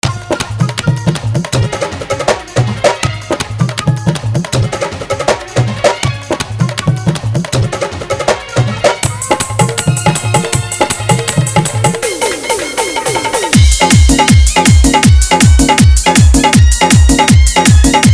Bhojpuri Dhollki Bass Rod Show Garda Dance Mix

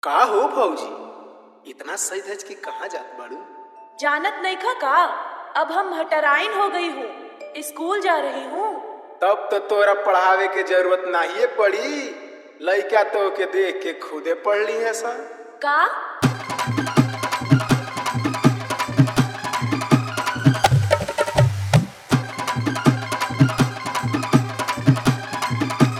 Full Dhollki Bass Rod Dance Mix, Bhojpuri Dj Song

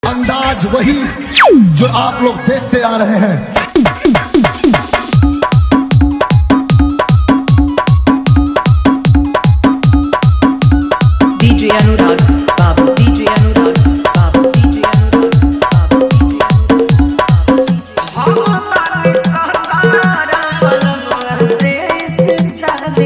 Dj Remix Hard Bass Mix

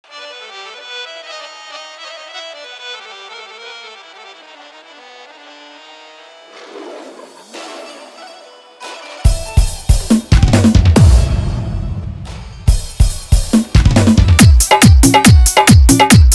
Hard Bass Mix, Bhojpuri Dj Song

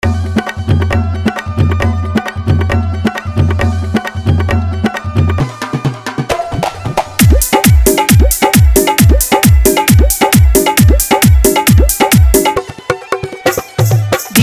Dhollki Bass Dance Mix

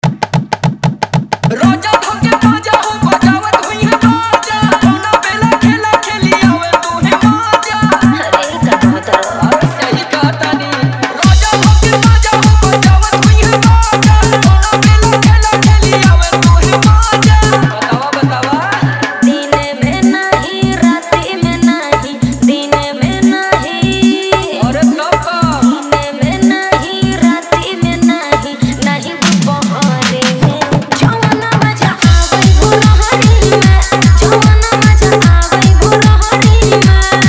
Fully Dholki Bass Dance Mix, Bhojpuri Dj Song

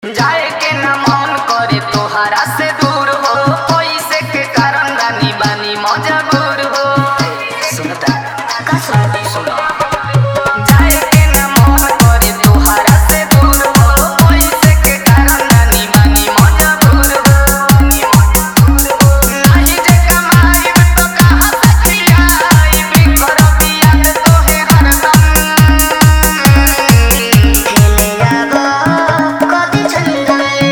Bhojpuri dj song dholki bass mix

